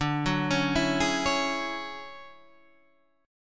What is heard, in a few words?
D Major Sharp 11th D, chord; arpeggiated; JGuitar